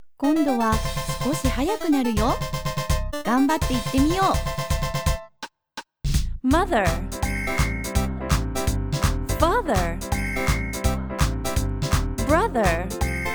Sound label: 50's rock; kindergarten practice